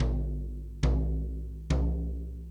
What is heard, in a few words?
Jazz Drums, E-MU Pro-Cussion; E-MU Pro-Cussion-1